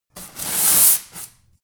broom sweep close

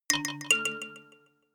notification kalimba delay fifth up

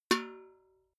metal bucket hit ring small

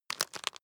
bone break midsize

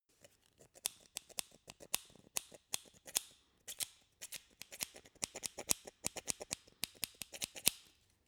scissors handle

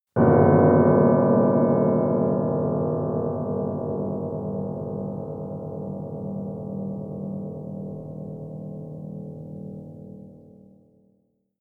piano deep dissonant

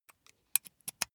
insert usb cable slow

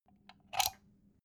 socket pull plug soft

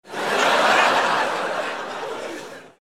laugh

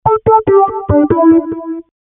melodic

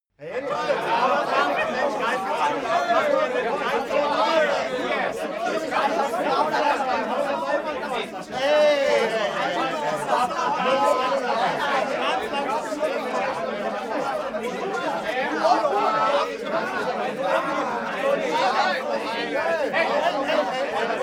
male crowd grumpy

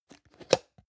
plastic can small lid open medium

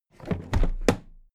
toolbox put down heavy

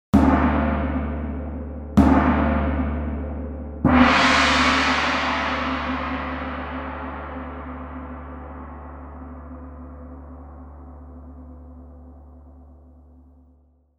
gong in b three times

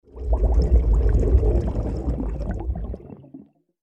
bubbling mid freq

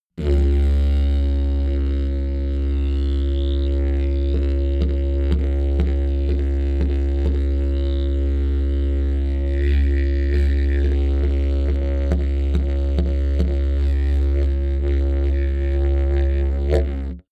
sequence didgeridoo single tone sequence long

